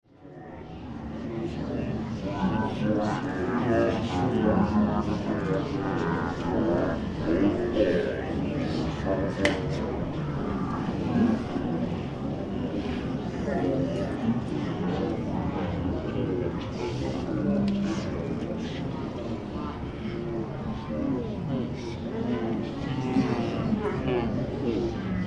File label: Theatre crowd in foyer waiting to be seated general chatter; Theater Sound Effects